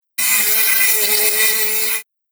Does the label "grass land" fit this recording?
No